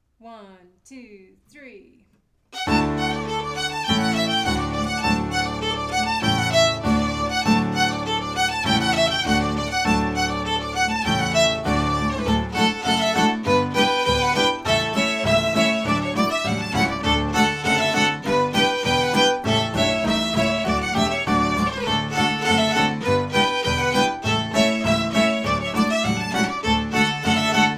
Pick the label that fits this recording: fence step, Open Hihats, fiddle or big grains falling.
fiddle